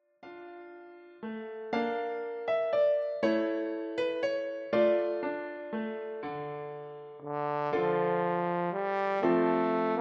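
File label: Greensleeves, Horn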